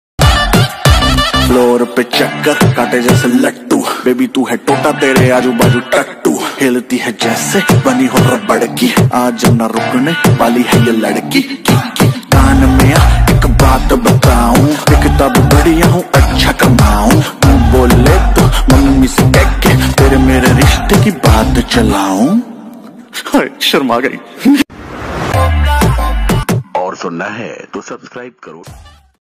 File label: rap ringtone